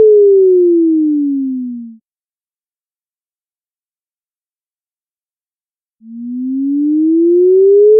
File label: tone silence tone; mozilla-central